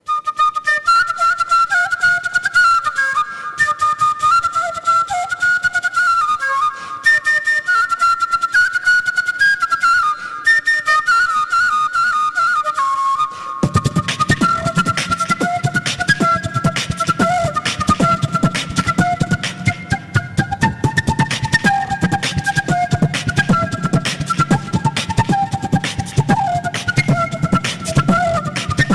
flute beatbox

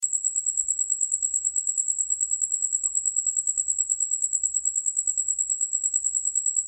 Insect Cricket

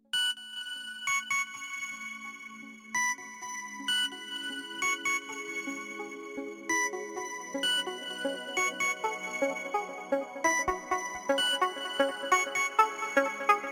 Techno Pink Club

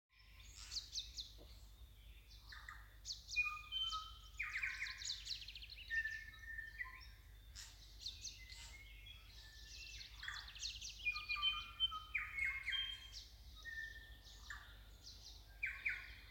Fiordland bush Tuis

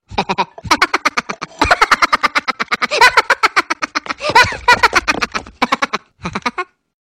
Funny Laugh

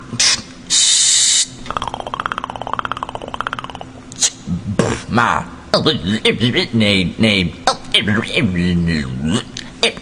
Beatbox

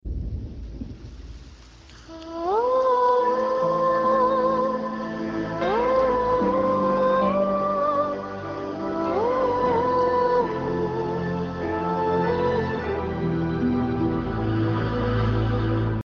Sad Theme

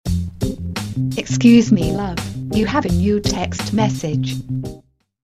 Sms Alert

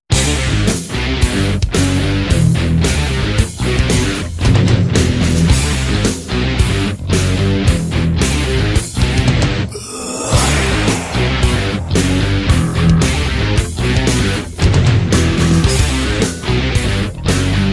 Rocking guitar

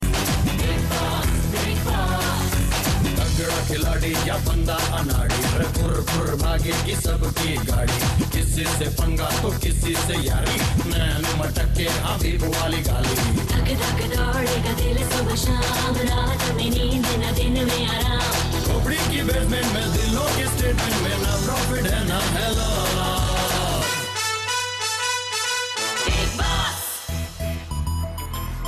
Rap

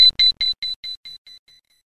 Sms Tingggg

